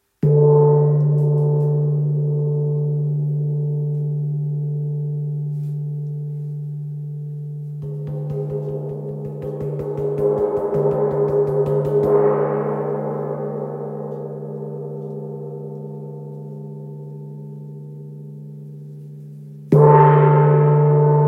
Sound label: Wind gong 45cm mi